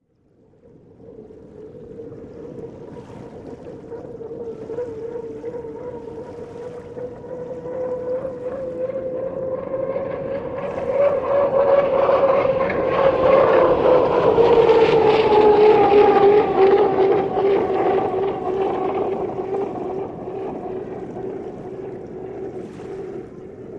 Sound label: Hydroplane By Splas